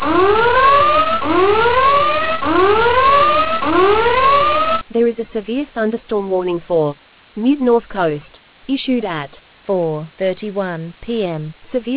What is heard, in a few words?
storm audio